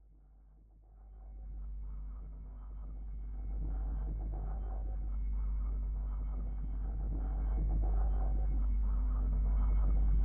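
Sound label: Bass From Oboe And String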